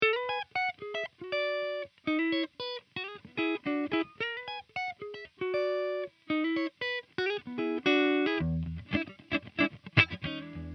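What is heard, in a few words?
Legend V128 Clean, Clean riff; eminence; Josh Smith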